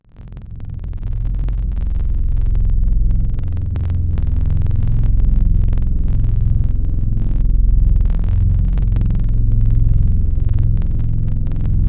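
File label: Violin Legato, wavetable; Synthetic's Serum Collection; Aubit - 19.75 Vol. 1 Tables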